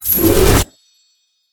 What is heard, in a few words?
activate; smite; hera